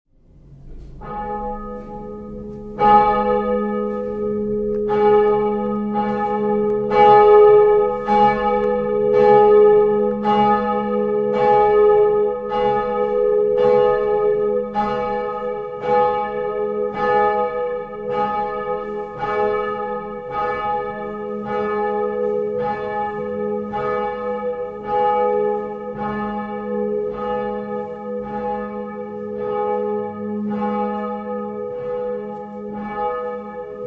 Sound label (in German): Glocke